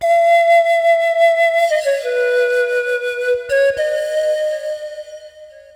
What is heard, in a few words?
FLUTE ETHNO 125